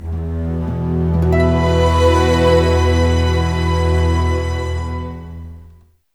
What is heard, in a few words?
CLOCK; East West; Scoring Tools; ORC; Clock Time